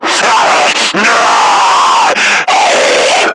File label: Screamer Idle; Warframe; Lasrian